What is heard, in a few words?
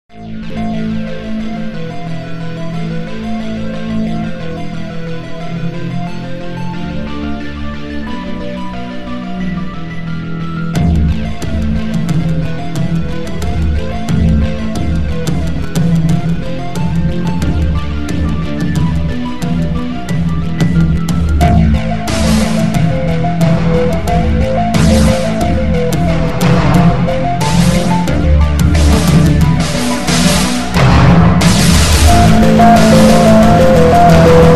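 fuzz intro instrumental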